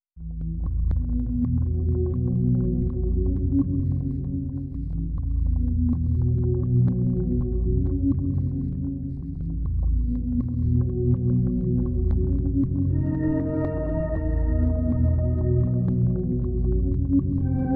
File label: ventilator